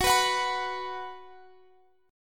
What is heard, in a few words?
B 5th Gb, chord